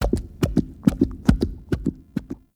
Infested Burst Rifle Heart Beat, weapons

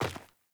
Sandals Land Rock, Footsteps; Characters; Player

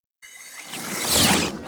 Shrimp Lantern Weapon Projectile By; Creatures; Baliq

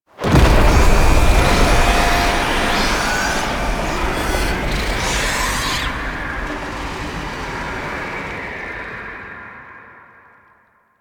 Hub Alarm Stinger; Warframe; Quests; Entrati Labs

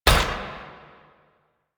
Melee Step; Warframe; Necramech

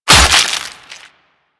Axe Impact Flesh; Warframe; Weapons

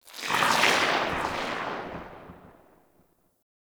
Sentient Start Move; Sentient Movement

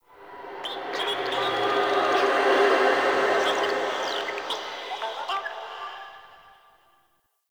Interior Cave, Ambience; Zariman Thrax Memories; Warframe; Duviri

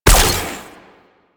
Weapon Fire; Warframe; Sentients; Dolicholyst

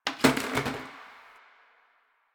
Janitor Plastic Bottle Water Drop; Warframe; Ambience; Nineteen Ninety Nine

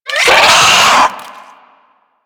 Infested Biped Pain; Warframe; Moa

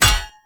Hammer Impact Shield; Weapon Impacts; Player; Combat

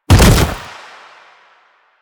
Bodyfall Light Default; Warframe; Lasrian; Lasrian Soldiers; Foley; Body Falls

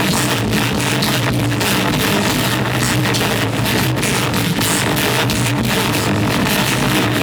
Active Loop, Infested Arc Rifle; Warframe; Weapons; Coda Synapse

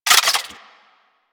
Arch Burst Gun Reload Mech, Weapons; DE; Warframe; Archwing

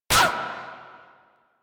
Player Hit Noise; Warframe; Mini Games; Caliber Chicks Minigame

